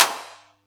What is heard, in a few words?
Yamaha EX-5